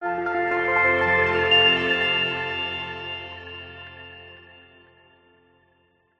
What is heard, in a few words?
multimedia logo musical short warm delayed soft trusted; zapsplat